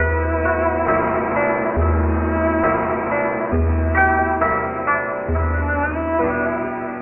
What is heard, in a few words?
strings, Sad